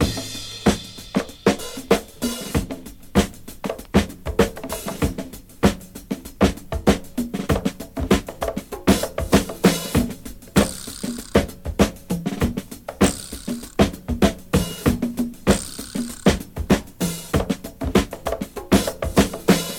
97 bpm fresh house drum loop e key, kick tuned to the E note